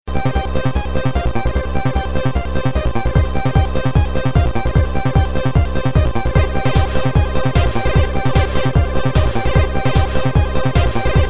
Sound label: synthetik